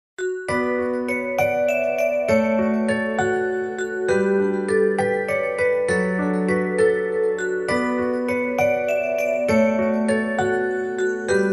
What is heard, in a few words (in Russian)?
ksilofon